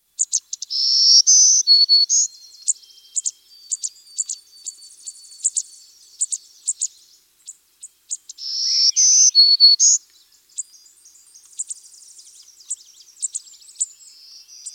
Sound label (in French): chant